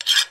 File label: scrape, metal